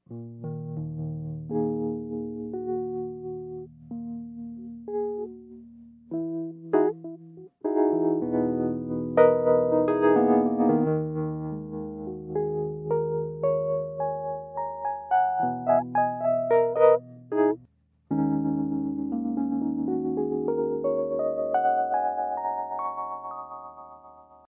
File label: wurly vibrato